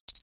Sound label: chip put down chip